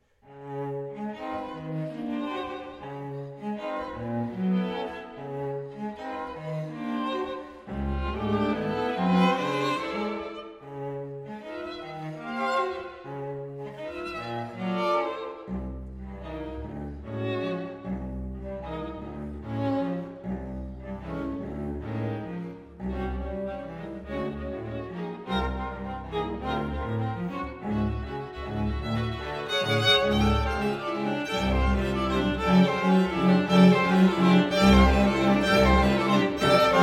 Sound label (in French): Quatuor a cordes; Francoise Choveaux; 3e variation (desinvolte)